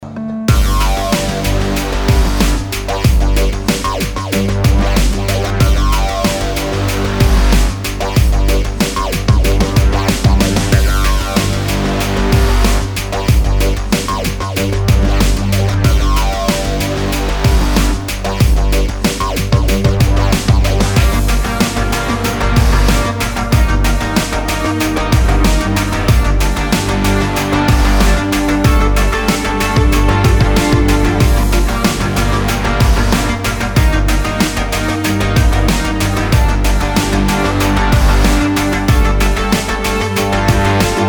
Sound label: synthwave